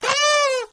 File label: life lost; samegame